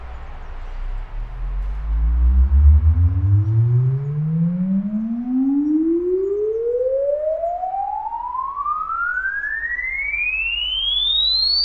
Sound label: response nastro; magnetostrittivi